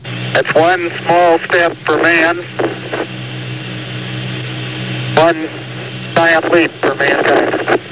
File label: moonstep